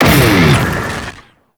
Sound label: Fire Shot Loop Start, weapons; Lizzie; Warframe